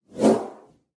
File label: Text Message Woosh; SoundsCrate